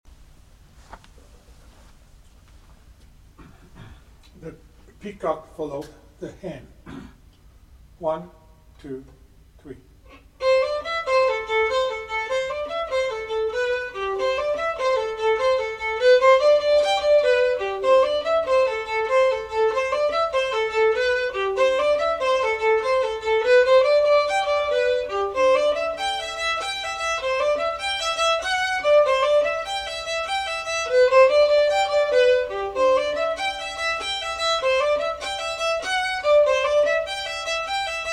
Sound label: The Peacock, fiddle